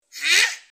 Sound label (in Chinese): 哈（小孩）